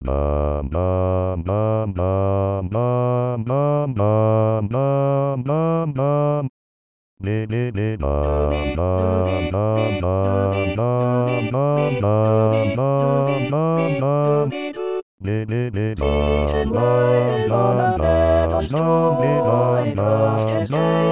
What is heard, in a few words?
BASS See Him Lying On A Bed of Straw